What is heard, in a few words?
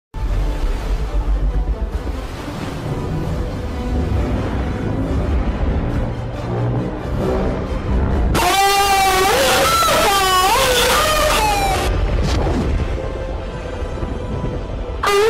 moan; Godzilla